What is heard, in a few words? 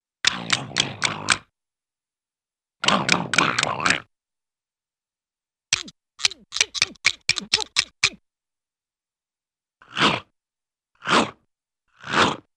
Funny Teeth Clacks Growls, Cartoon; Hollywood Edge; Cartoon Trax